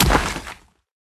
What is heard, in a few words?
Frozen Heart Death